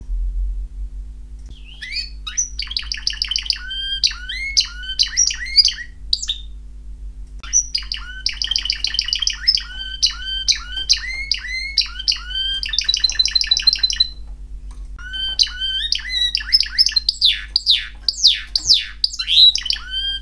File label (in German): Hahn